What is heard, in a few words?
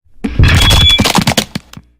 mic break